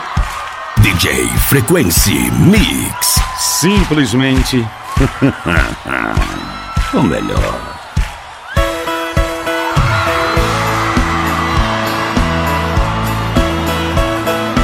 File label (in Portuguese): moda de viola; frequency mix